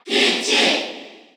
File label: Cheer Korean; Peach; SSBU